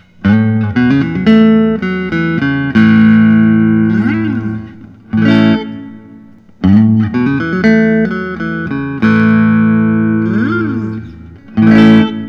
thorn shoreline gold

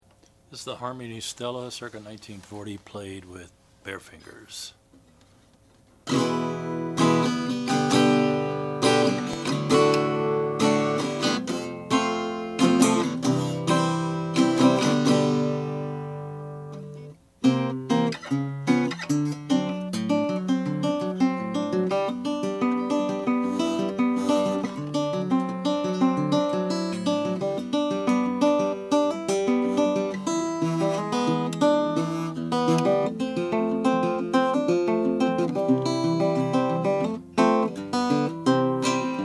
harmony stella glossy oak harmony stella

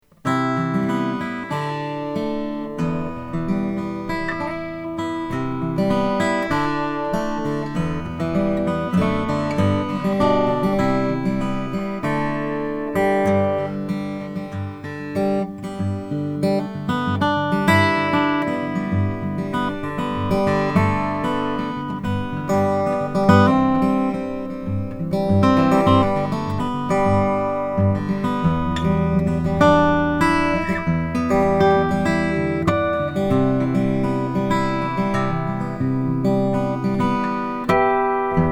greenfield g1 fanned fret amazon rosewood

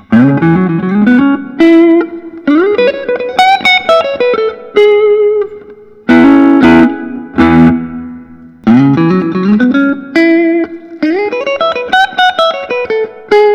vinetto sc 50; 2008

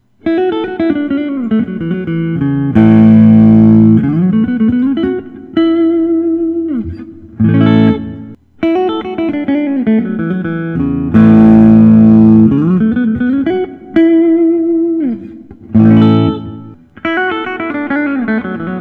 collings i 35 deluxe; tobacco sunburst

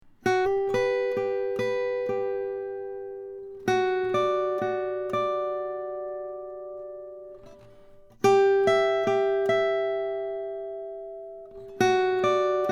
collings 02h 12 fret indian rosewood

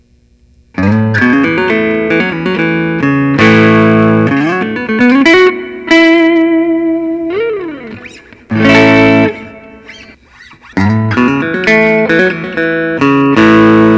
collings i 35 lc aged walnut

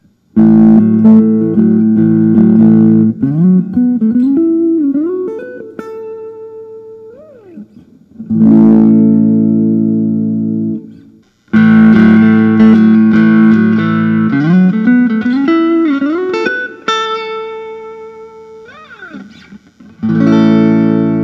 fender telecaster

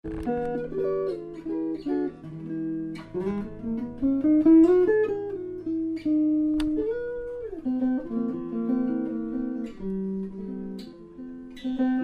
peerless new york; natural blonde

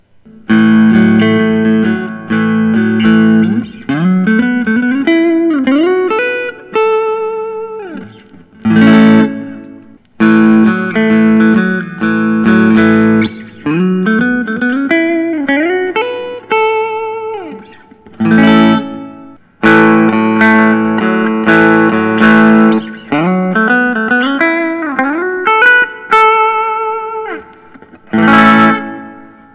collings i 30 lc sunburst; throbak

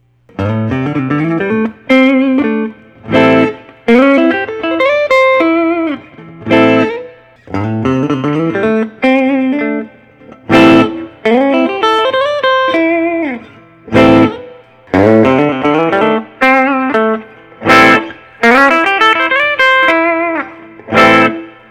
bone 59 brazilian washed; gil yaron

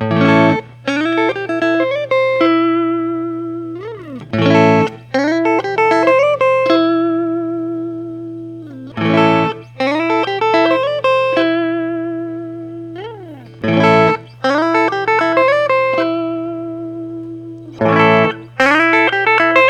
parker df624 radial series; root beer

